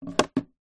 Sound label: camera dslr set down on table; technology; zapsplat